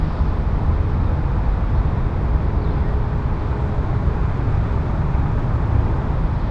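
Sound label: ambience bank; ambient; atmosphere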